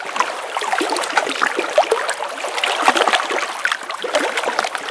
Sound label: waves; Mafie 1